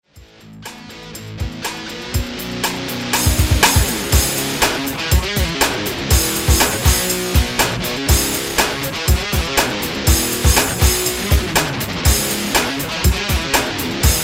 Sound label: riff